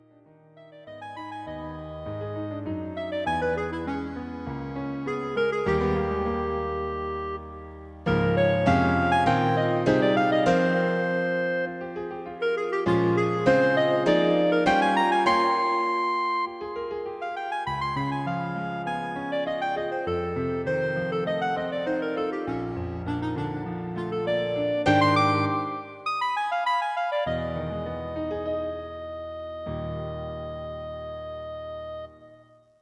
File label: Clarinet and Piano